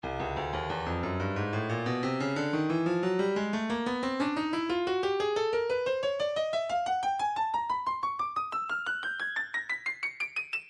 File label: breakbeat 090 bpm; hipping b; Stylus Breakbeat Midi Files